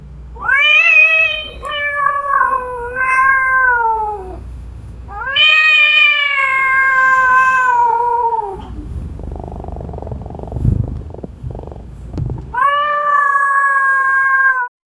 too many cats fighting, commotion

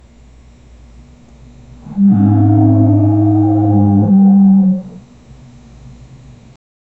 monster alligator hissing

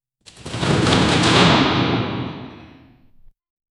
parkour grab vaulting, sport

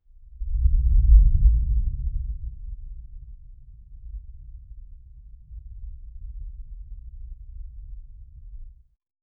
prehistoric frozen plain

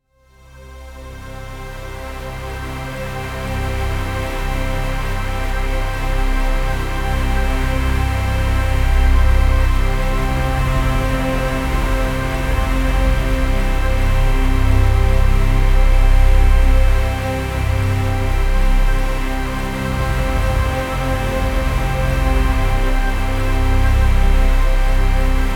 flames of the sun solar, celestial

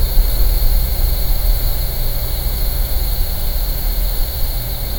subtle hum; natural

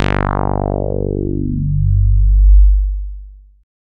a powerful glissando from, bass

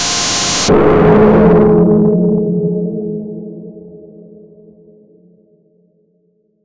heavy rock sting

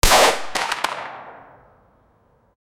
realistic heavy handgun

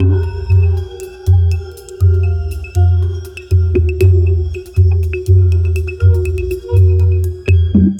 organic ceremonial groove, bassline

